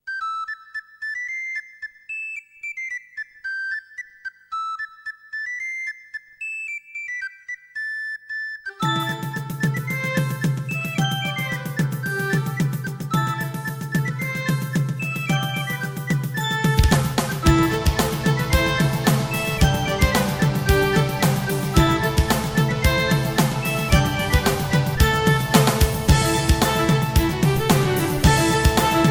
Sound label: Irish Dance